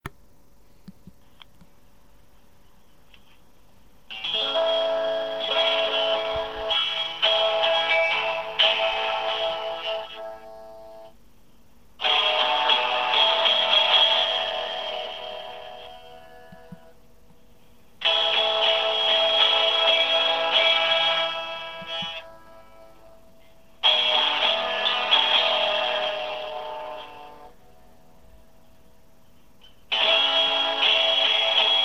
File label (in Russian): Neskolko akkordov